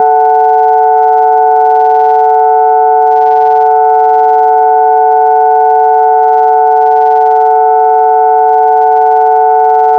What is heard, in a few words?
elemental